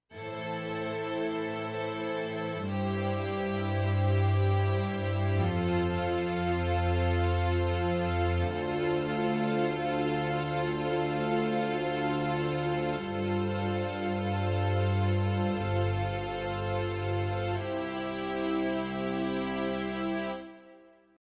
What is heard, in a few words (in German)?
Ensemble Streicher; Tyros5